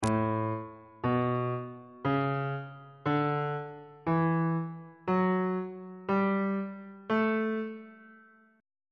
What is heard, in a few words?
a mixolydian mode on bass clef